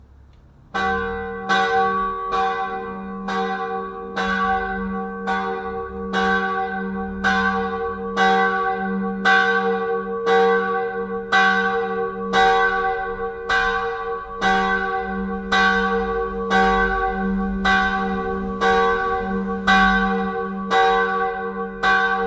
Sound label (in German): glocke